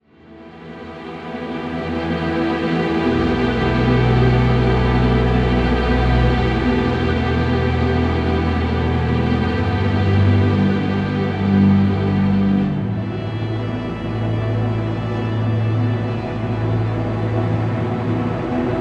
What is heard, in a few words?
Camp Strings C